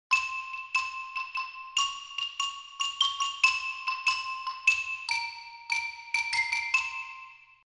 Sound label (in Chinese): 木琴独奏